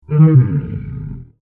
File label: Dinosaur Groan, Creature; Animal; Dinosaurs; Animals; BlastWave